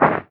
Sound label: Ground short; Devour 2021 gamerip pc macos